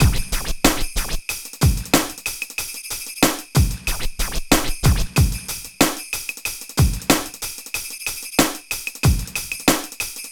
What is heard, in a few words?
Beat